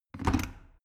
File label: plastic water boiler place